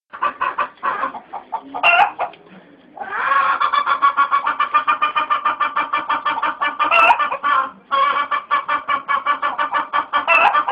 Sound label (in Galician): son galgalinha; animais